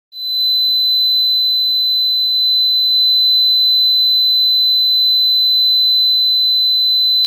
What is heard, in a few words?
buzzer sound